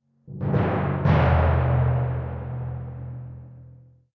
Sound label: Tymp Hits